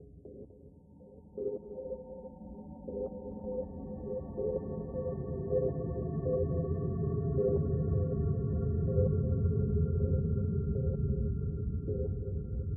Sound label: Space Loop